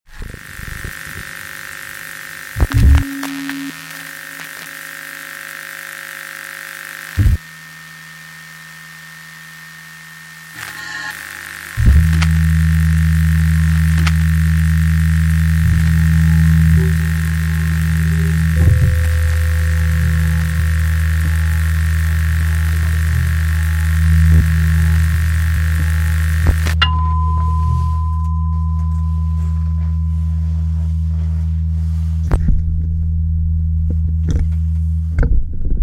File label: frequencies